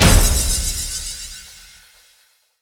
start Free Spin Click; netent

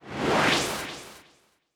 symbol Drop; netent

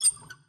ambience lamp; netent

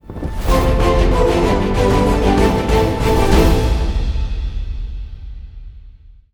free spin outro; netent

